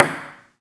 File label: PING PONG, SPORTS; 1000fx